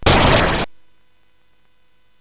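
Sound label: Nebulus, MOS 8580 SID chip music, games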